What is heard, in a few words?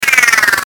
Descend Chopper Static; Descending Sound Effects; Blastwave